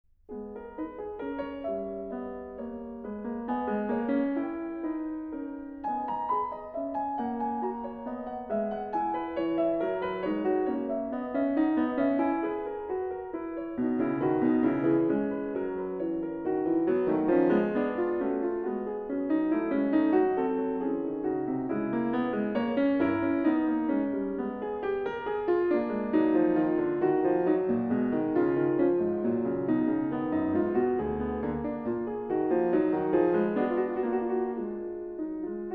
Prelude in G-sharp minor, The Well-Tempered Clavier; BWV 863; The Well-Tempered Clavier, Book 1; Angela Hewitt